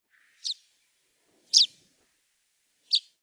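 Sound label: tawny pipit, flight call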